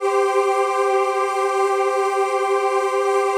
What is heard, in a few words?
PAD, ATMO PADS; Best Service; XX Large